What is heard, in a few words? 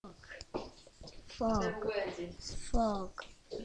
frog